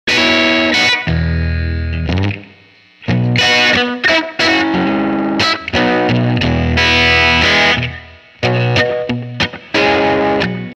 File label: Guitar; Sage Arts Records